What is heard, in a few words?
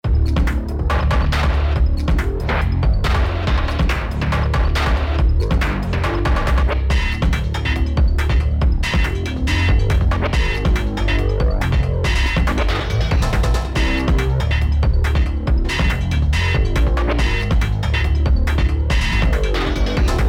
Tension; Luigi's Mansion 3